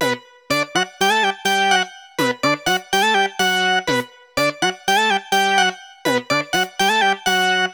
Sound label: Stack Lead; Sonic Foundry (Sony Creative Software); Club Joints and Street Anthems; Game Time